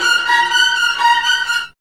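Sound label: string riff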